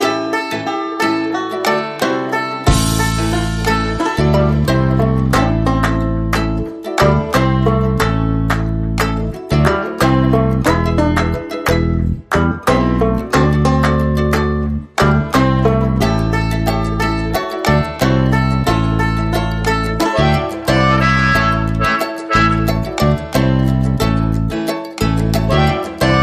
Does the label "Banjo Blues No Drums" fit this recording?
Yes